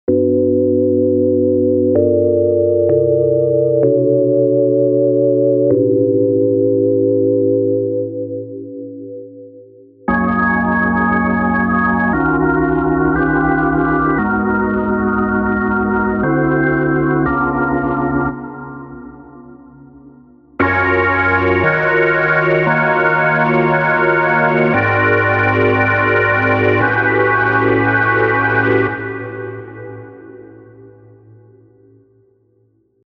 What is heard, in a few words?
Chords Jazz